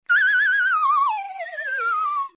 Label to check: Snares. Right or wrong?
Wrong